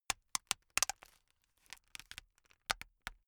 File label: plastic pressured long slow